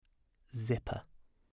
zipper

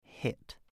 hit

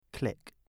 click

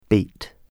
beat

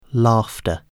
laughter

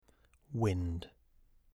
wind

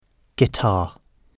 guitar

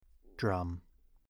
drum

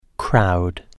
crowd

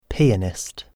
pianist

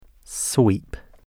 sweep

